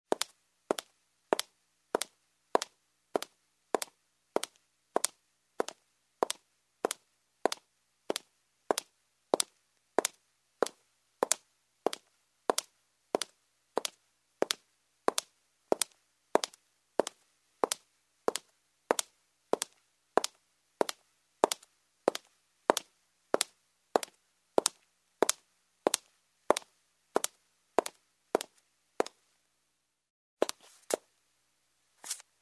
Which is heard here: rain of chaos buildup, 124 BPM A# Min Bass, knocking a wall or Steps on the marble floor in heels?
Steps on the marble floor in heels